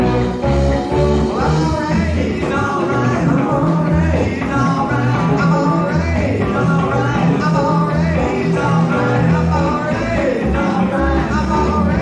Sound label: Alumni Blues; Plainfield, VT; Goddard College